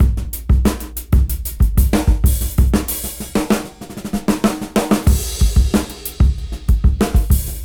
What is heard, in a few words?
Busy Break 93 BPM; AMG - Keith LeBlanc Phat Butt Jams